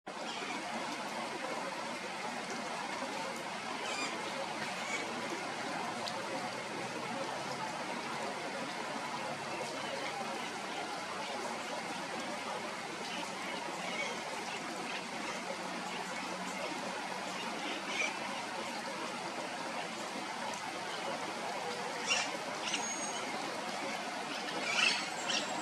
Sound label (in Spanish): cachana enicognathus ferrugineus